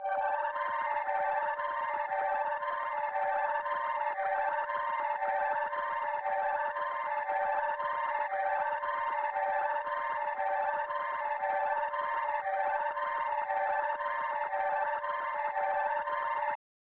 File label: 116 BPM G Min Arp High, Loop Stems; Cymatics; 2022 Melody USB Expansion; Needs